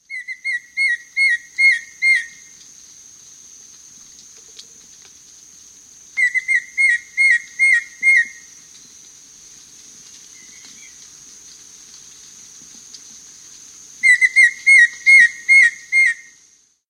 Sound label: White-Shouldered Antbird; S. America